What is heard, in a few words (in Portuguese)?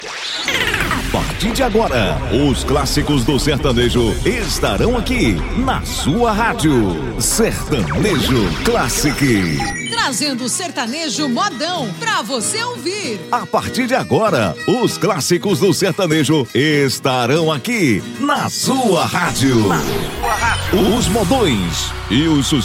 Sertanejo Classic